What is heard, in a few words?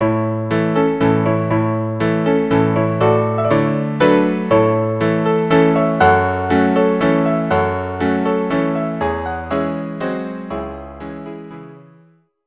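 waltz violin piano; Brahms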